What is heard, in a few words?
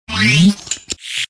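Cleaning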